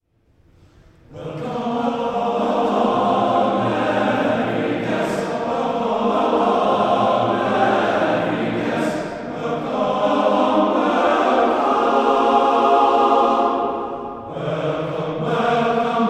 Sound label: Welcome Fanfare